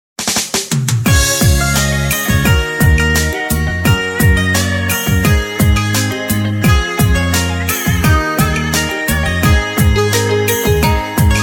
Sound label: beat, playback